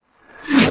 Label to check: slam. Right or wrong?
Right